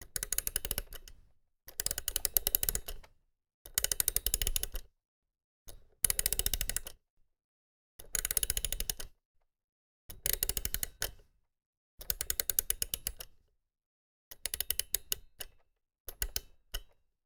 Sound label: music box wind; devices accessories utilities; NS Studios - ultra sound collection